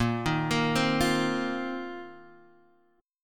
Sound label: A sharp Suspended 2nd Suspended 4th A sharp down Guitar Half Step Down